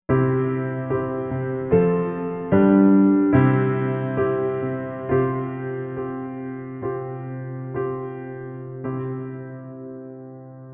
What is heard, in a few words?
sus4, Isus4 to I; altered chords